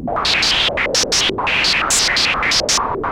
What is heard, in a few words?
ARP2600, MODULAR SYN; Vintage; Sonic Reality; Vintage Timetraveler